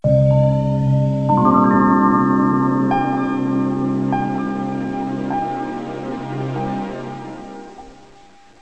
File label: Windows Startup; Windows Never Released Soundpack 1